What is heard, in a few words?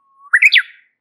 warbler